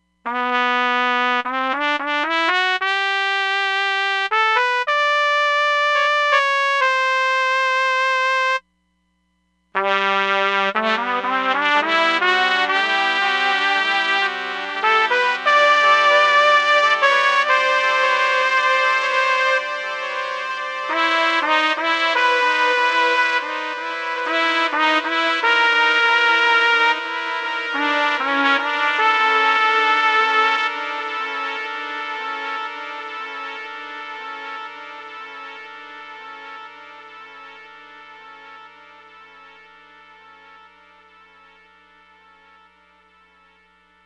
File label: Thick Chorus & 1 sec Pan Echoes, Roland DEP-5; Factory Patches